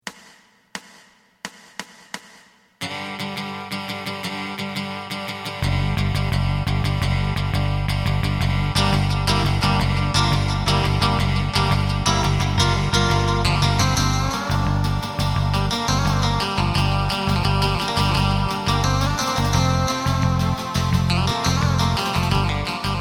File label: drum